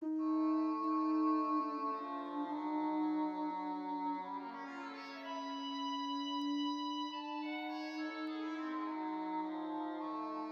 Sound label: oboe